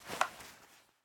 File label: Gamepad Take; raw game audio files; Mita; MiSide